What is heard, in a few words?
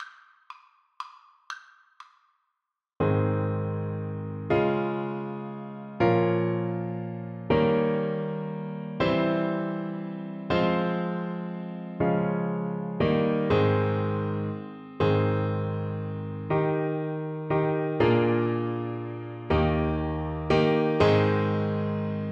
French Horn